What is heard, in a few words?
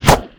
Rifle Skin, Damage